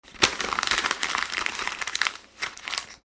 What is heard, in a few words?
paper; maoudamashii